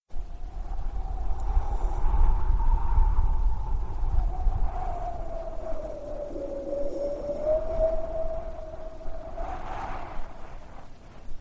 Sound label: wind